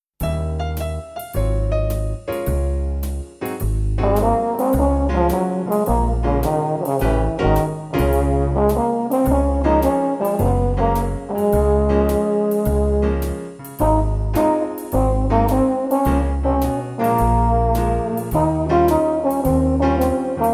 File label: Smooth Groove